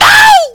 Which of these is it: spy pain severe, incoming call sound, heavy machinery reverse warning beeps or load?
spy pain severe